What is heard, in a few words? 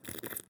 glue slurp